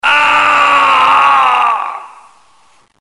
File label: death; max payne